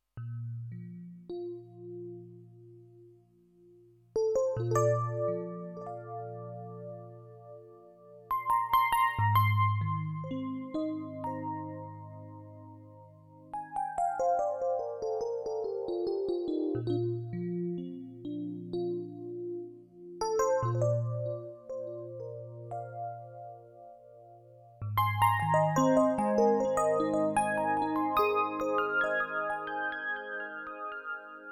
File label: Epiano